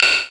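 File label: PER PROCU, Procussion, percussion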